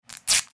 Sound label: sellotape pull